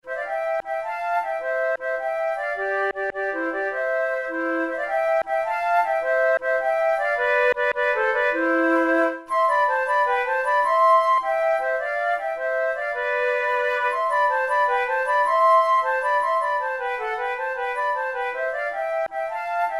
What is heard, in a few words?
duet